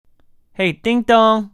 Ding Ding

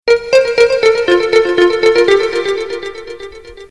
Koto Sms

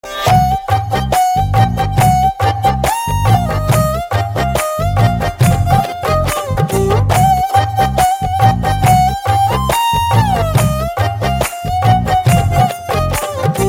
Theme Music

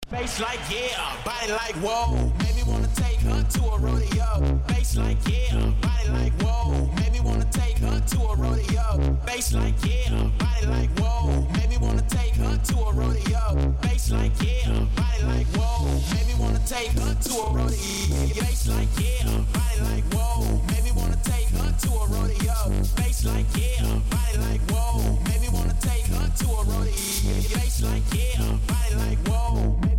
Bass

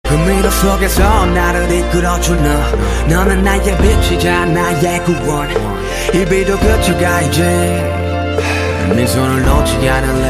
Heartbeat

